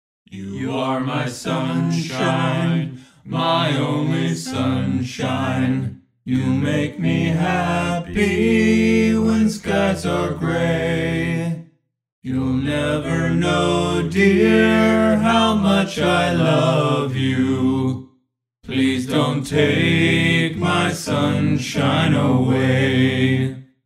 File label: Barbershop; Soundiron Questionably Barbershop